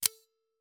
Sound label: Switchblades, OTF, quality, close, unsheath, mechanical, tight, spring, ringing; Vaeyan II